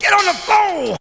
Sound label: VOX; Zero G; Man Machine